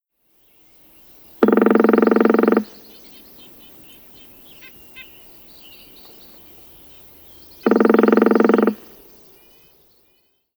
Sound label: Northern Flicker bec